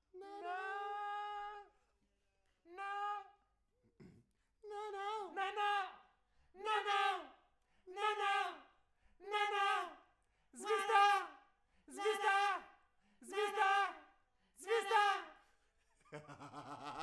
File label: Voc